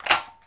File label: Gun Handling Weapon, Weapons Foley; SFX Kit